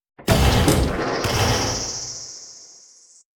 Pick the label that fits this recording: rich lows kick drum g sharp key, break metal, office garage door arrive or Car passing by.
break metal